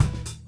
HIT; PlatinumPhattCD1; E-MU Producer Series Vol. 8 – Platinum Phatt; Grooves Filz Hitz

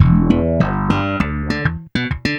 Philter (snap bass); Sonic Foundry (Sony Creative Software); Ugly Remnants VOL-2

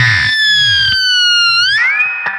FX Guitar

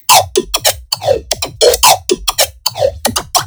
Instrument Layer, bass

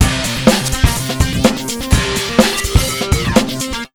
beat, Electro 125, Big Beat Drumloops

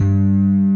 RHODES; Pro-Rec - Synthsonic

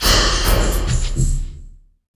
PLAS LP, Industrial Loops; USB Soundscan vol.24; AKAI

